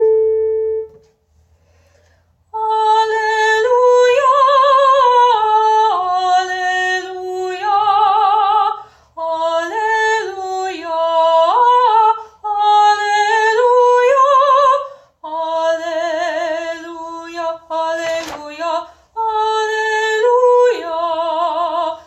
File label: alleluia soprano